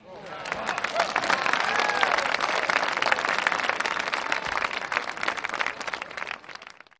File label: Appls Med Crowd Outdo; medium